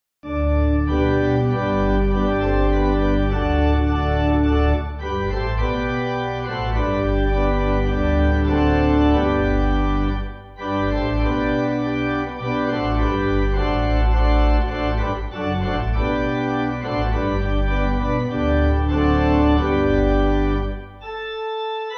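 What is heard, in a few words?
pipe organ, Music Backing Files for Churches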